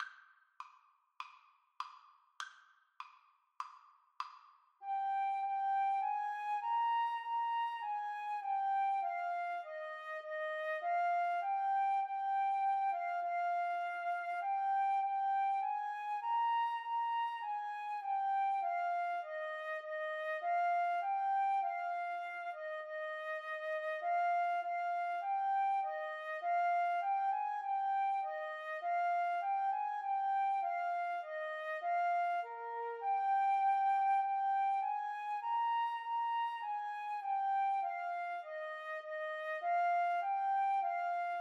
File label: ode to joy, Flute Clarinet duet; beethoven